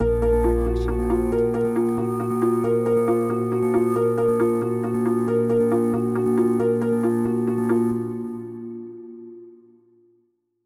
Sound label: Mystery Harp; soundscrate